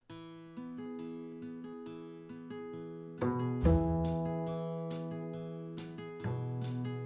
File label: Music Disco Folk; Plants vs. Zombies Battle for Neighborville; EliteBoss